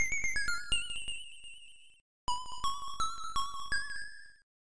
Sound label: Jingle